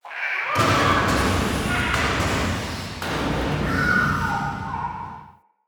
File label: Animal Interior Destruction; Encounters; Ode Pollution Tower